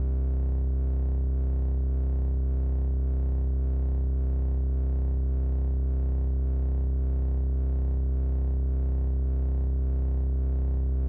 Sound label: Distorted Bass